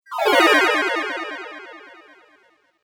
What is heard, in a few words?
Magic Fx Video Game